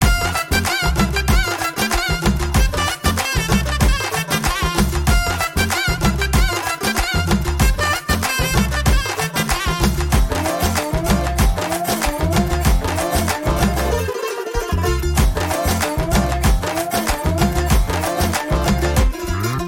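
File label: background music; Jai Bhim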